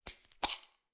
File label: Pill Bottle Open Cap